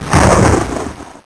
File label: lightning; wesnoth